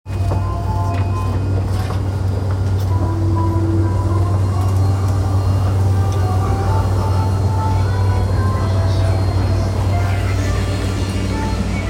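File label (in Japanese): 山手線 高田馬場 clockwise, train sounds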